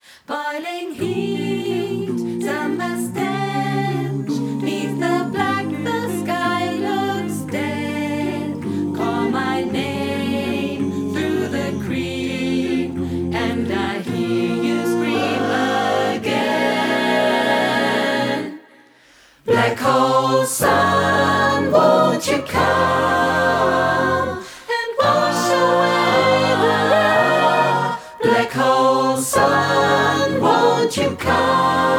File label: Remote Choir Bass Enhance Both Out